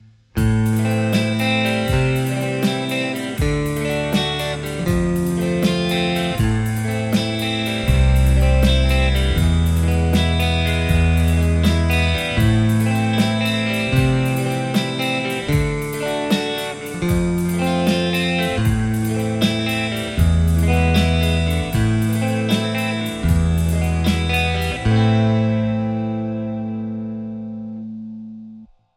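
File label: Telecaster; igniteamps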